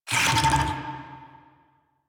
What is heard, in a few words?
Infested Big Ashen Vocal Pain; Warframe